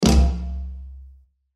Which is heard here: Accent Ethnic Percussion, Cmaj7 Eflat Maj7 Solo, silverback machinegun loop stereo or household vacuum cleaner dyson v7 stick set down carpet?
Accent Ethnic Percussion